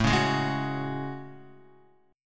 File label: A Major 13th, down Guitar; AM13; strummed; JGuitar